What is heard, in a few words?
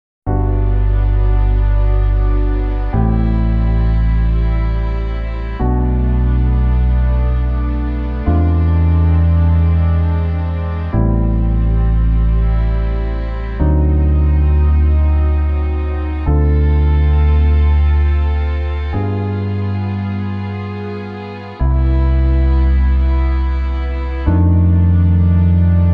Broadway Underscore, instrumental; Spamalot; Portland Drama Club